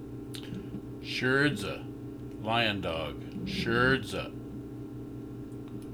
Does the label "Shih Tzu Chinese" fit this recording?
Yes